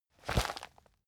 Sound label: plastic bag hit medium